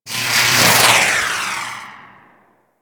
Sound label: Tractor Beam Rail Random A; Crp Space Bridge Transition